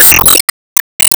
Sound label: Enemy Hourgrass Active Shot